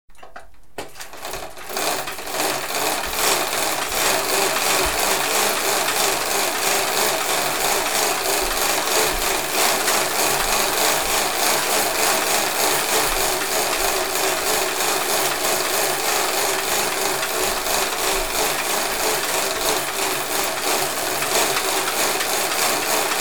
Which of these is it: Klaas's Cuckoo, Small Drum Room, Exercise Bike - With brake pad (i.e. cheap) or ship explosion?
Exercise Bike - With brake pad (i.e. cheap)